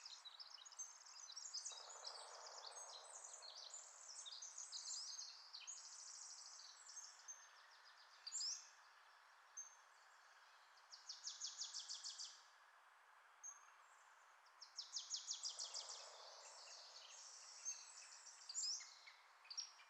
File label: ambience birds a medium; Rust